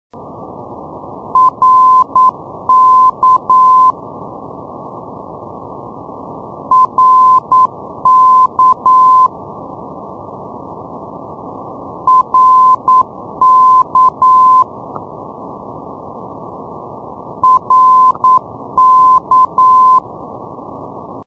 ndb reykjavik

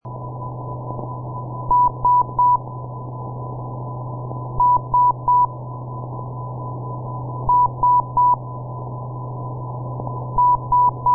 ndb darlowo; kiwi

